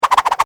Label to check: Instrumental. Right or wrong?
Wrong